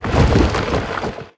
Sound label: level pass log